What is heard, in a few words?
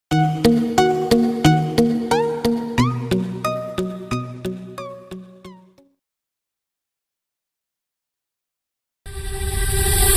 bgm ringtone horror bgm horror theme music bgm; annabelle